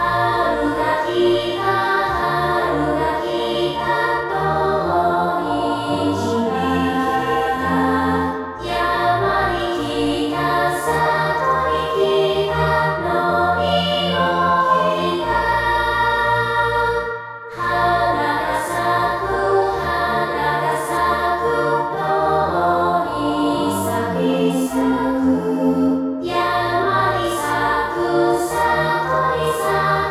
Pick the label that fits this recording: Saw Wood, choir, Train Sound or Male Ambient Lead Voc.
choir